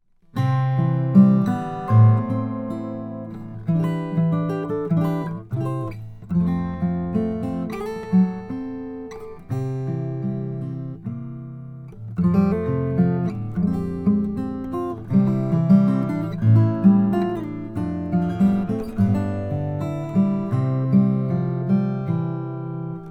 2010 santa cruz h13 all koa

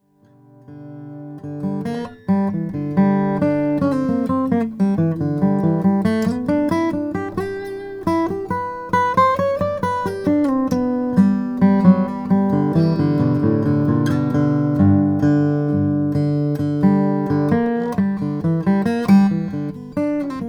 martin m 36 indian rosewood

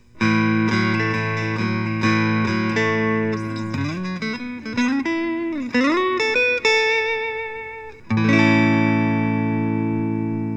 fender telecaster

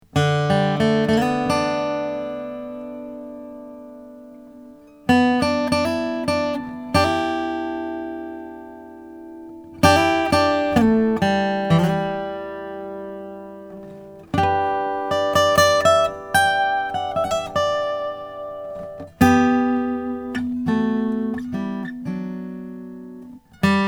2010 laurie williams tui cutaway; whitebait ancient kauri

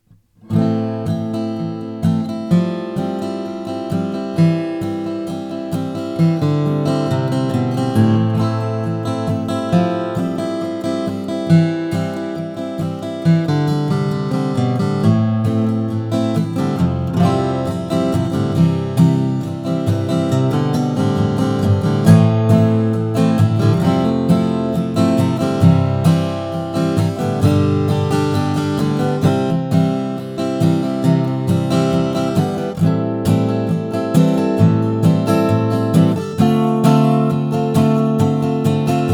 stehr auditorium redwood; lucky strike

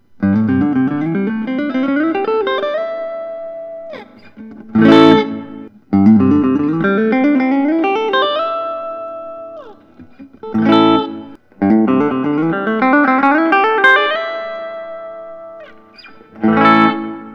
collings i 35 deluxe spruce top blonde

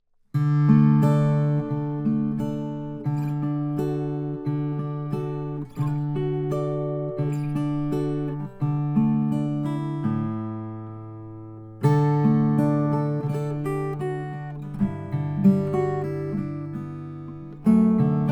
grand parlor cocobolo; ryan abbey

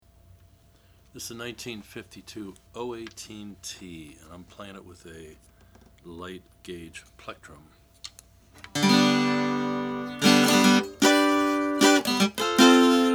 martin 0 18t natural tenor

